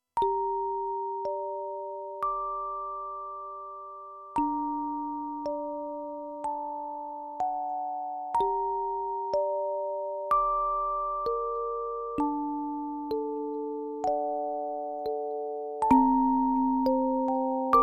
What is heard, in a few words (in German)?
C15 Musikbox